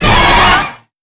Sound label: Grunt Hit Heavy; netent